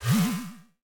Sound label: Flap; smite; god cupid skin13